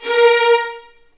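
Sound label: A#4 violins; The Ultimate String Collection; East West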